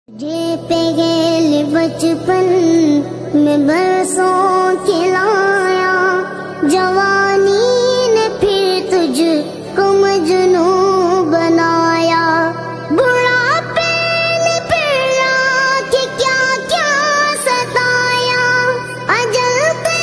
islamic beautiful ringtone qurawali ringtone arabic ringtones; viral islamic ringtone